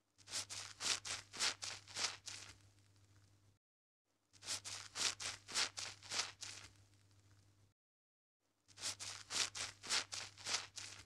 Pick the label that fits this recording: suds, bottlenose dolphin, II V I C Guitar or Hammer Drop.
suds